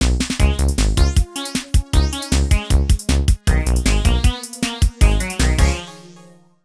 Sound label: Jingle Level Done; Sunday Lawn